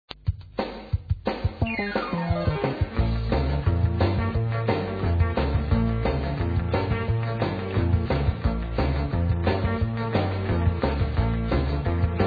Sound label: Country Rock